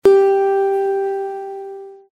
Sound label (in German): Zithermacher Ton 8 LAUTER